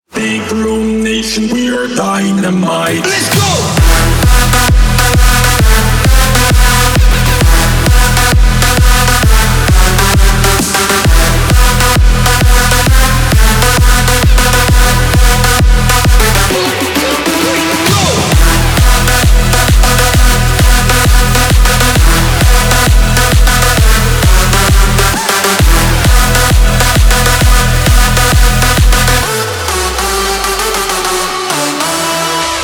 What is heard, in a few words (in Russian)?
moshhnyj gromkij klubnjak na zvonok